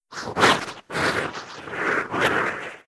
Husk; Legacy husk

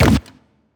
spike Launch; Thalamus; CARRIER

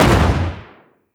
Frag Grenade 40mm Shot; Weapons

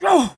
male damage; Human

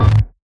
burst; Watcher

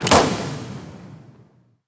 Harpoon Gun; Weapons

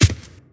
Footstep Mudraptor; Footsteps, Monsters

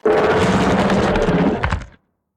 HAMMERHEAD idle; Hammerhead gold

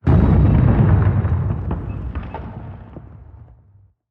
Structure Blunt; Damage

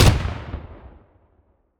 auto Shotgun; Security; WEAPONS

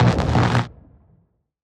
alien coil

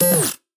interact, Defensebot

